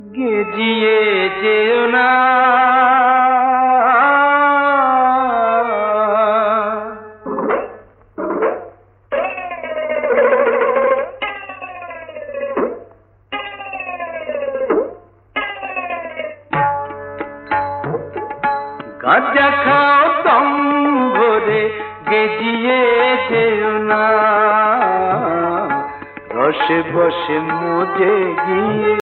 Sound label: bengali ringtone